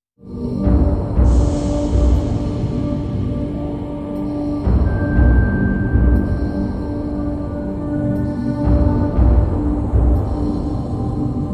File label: Beat